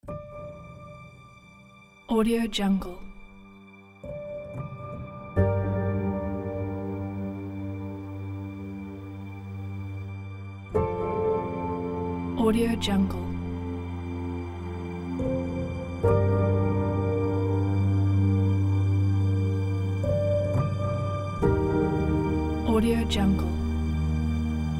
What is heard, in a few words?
emotional piano soundscape